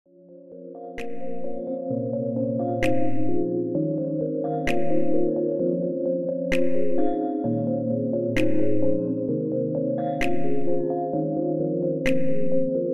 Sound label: Try again Jazz